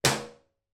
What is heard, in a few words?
household soap bar drop into bath